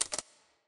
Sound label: photo click; Minetest; backrooms; JamesMontour